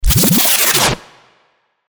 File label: BREAKER VINYL REWIND